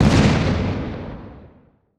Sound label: Explosion Mine Blast Short; Fire and Explosions